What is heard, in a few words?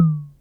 SYNCUSSION